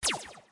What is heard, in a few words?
Fighter Laser